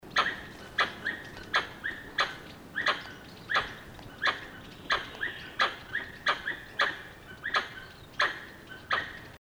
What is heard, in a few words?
Formosan macaque; Macaca cyclopis; call; Bird Call Recordings